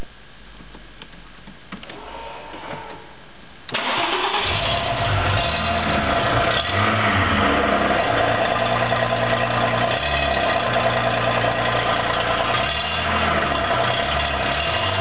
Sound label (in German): auspufflaut; Auto